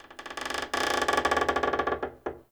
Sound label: Structure Wood Creak Small; Ambience; Dendrit; Fort